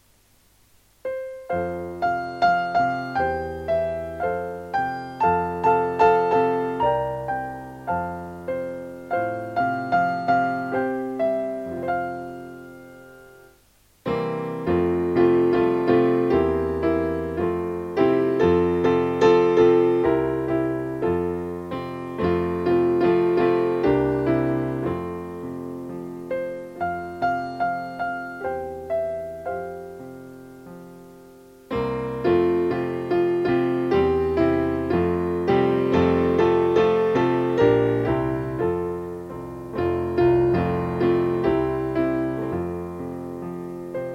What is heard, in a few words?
instrumental